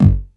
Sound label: bass drum, drum & bass; Ueberschall; Drum & Bass Resonance